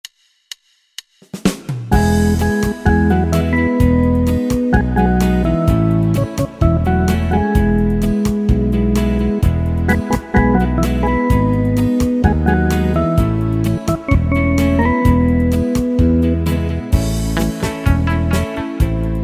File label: 60's Guitar Pop, Yamaha PSR-3000 Factory Styles; Pop & Rock